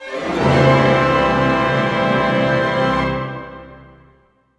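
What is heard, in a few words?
glissando C major, orchestra; Sound On Sound - Peter Siedleczek's Orchestra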